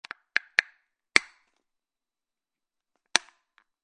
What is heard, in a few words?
Pouring shampoo; ریختن شامپو